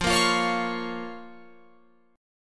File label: F Suspended 4th Sharp 5th F, Guitar Open F; Fsus4#5; strummed